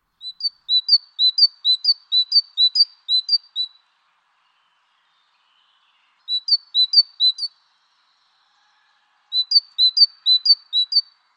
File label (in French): mesange charboniere, oiseaux; mesange charbonniere